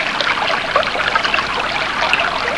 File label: waterrun; svencoop